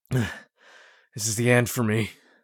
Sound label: hurt near death; Escape from Tarkov